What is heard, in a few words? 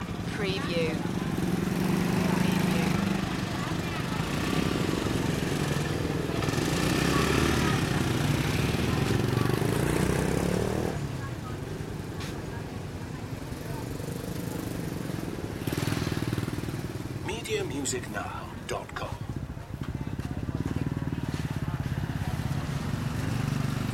motorbike street ambience; loop